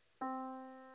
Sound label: Yamaha Disk, piano sound